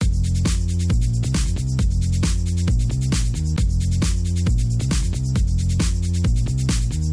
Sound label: beat; Audio Processing; Python